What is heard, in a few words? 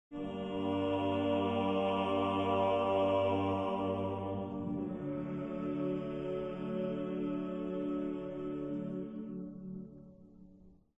amen